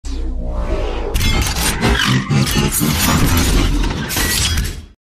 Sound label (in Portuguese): Som do Transformador